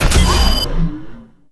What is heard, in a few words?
show map; cdogs